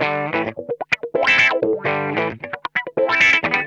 ROCKIN, Funk Guitar; 90 s Sample CDs; Zero G

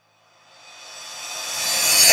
Reverse Cymbals

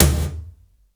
BG TOM, METALIC DRM; Crypton - DrumBase5000; METALIC DRM2

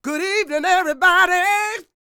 SING W, Vocal Planet Blues & Soul, BLUESY; ILIO

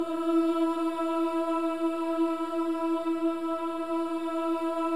Boys; Spectrasonics; Symphony of Voices

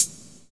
CYM 808, Drum Machine; Roland L-CD701; Cheese menus

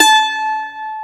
MANDOLIN A5; AKAI S-Series CD-ROM Sound Library VOL-7

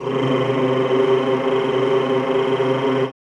CHO C2, Rrrr; Sound & Vision; Gigapack I CD 2; CHO Mmhh + Rrrr

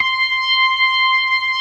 STOPS, Synth and Organ

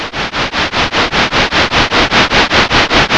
S TRAIN, SYNTH FXS; East West - Global Sounds

